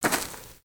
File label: Footstep; creatures organic; Lego; Strombie